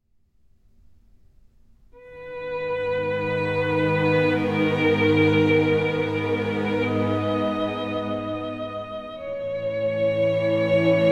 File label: Violinus; Last Dream World Unknown